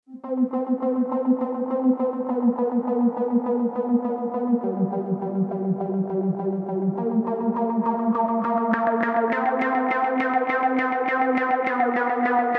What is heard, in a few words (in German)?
Synth EQ ohne Transformator; UnderToneAudio MPEQ-1